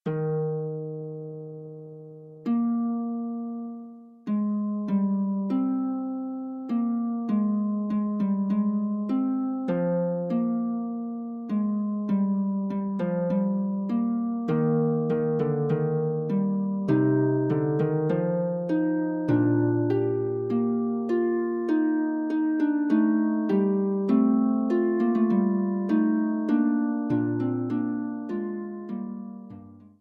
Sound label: Fugue, harp; Forty Eight Preludes and Fugues Book 2